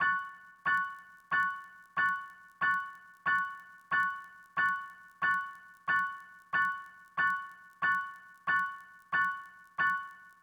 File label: Acoustic Piano